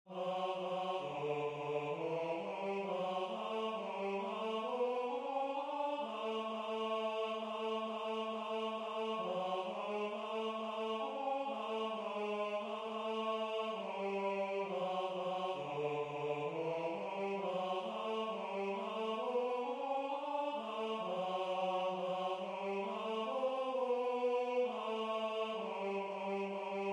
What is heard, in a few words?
Tenor